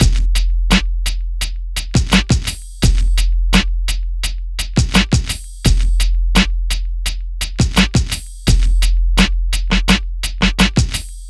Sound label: DRM, Hip Hop and R&B Drumloops